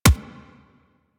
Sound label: Weapon Punch Pistol; Warframe; Weapons; Pistols